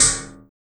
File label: RSS PERC